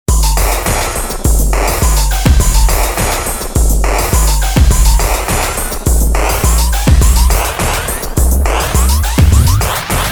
Sequential Prophet X Grimy Drumz

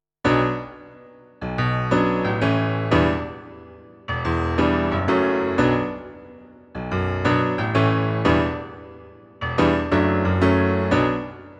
Piano Stabs G24 passive mixed; Gyraf GXXIV Test

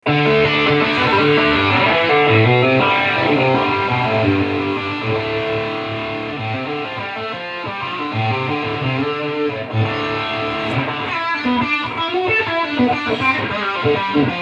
Metal

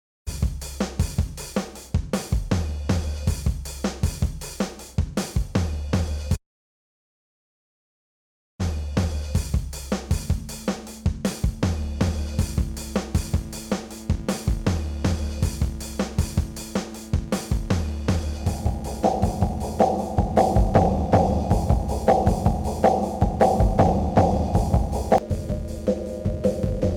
Reverb; XILS3

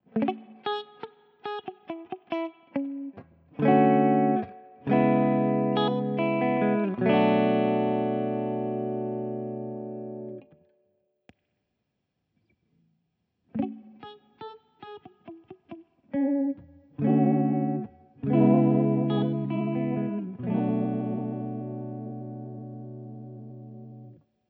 LoFi Wobble

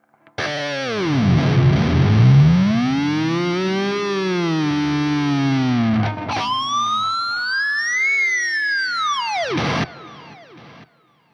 RGT1270 rhythm Floyd

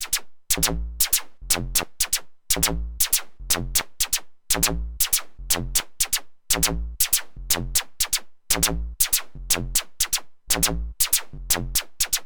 Dreadbox Drips V2

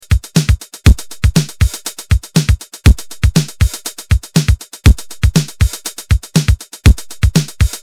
tr Accent

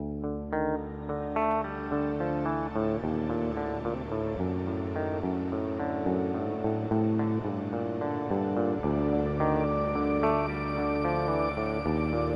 Eventide H9 Dark Pad

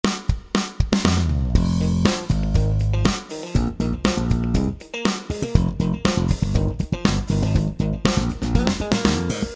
80s Brit Funk

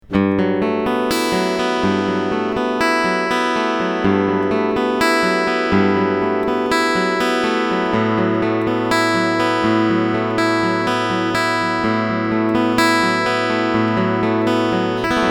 Picking EQ Flat

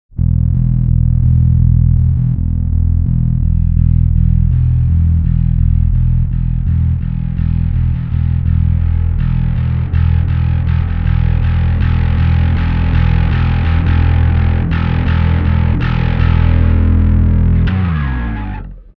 Darkglass Microtubes X7 Multiband Levels

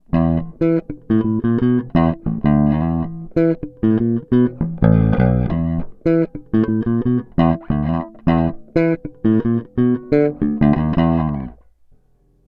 Bass and treble cut

